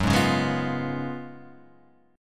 Gb Major 7th Suspended 2nd Suspended 4th Gb down, GbM7sus2sus4 chord; GbM7sus2sus4 strummed; JGuitar